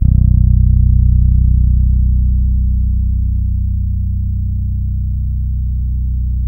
Bass, FINGERED; Spectrasonics; Bass Legends

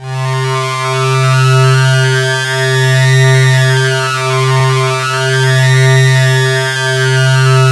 SYN LEGEND, SYN MicroWave; Sound & Vision - Gigapack I CD 2

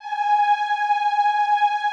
EEH G#4, Extended Classical Choir; Best Service

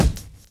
DRUM CHOP; AMG Gota Yashiki Groove Activator

